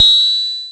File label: BOSS PERC SNTH, DRUMS PERC